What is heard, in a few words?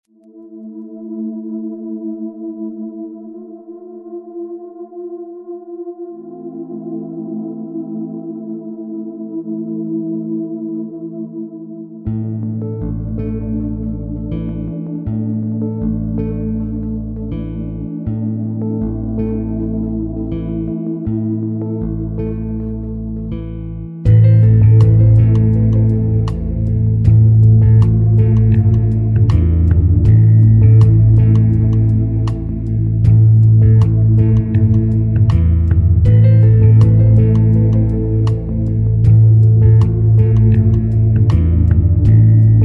lounge Remix